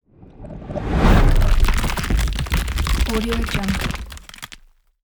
elements heavy rock grow cast; smartsoundfx

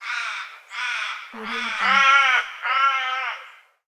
crows

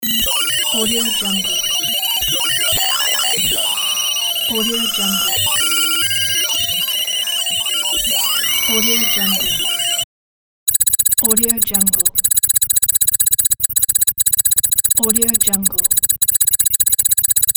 computer calculations

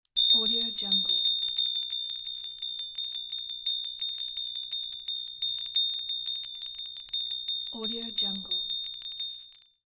funny bells

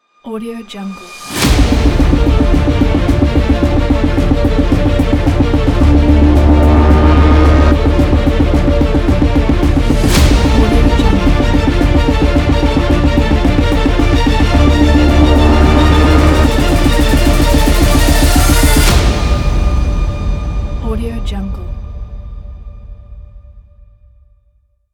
epic hybrid cinematic ident

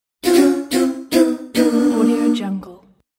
fail

